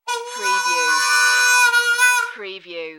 Harmonica Riff

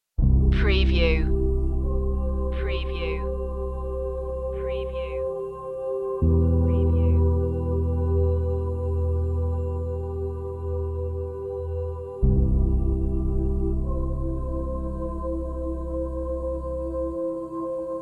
underscore

